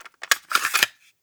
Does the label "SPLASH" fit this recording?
No